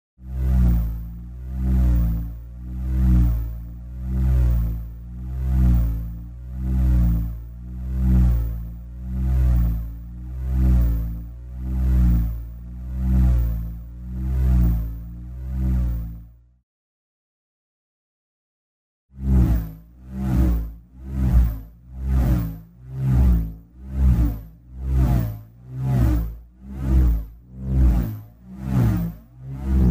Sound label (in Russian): zhuzhzhanie elektricheskogo toka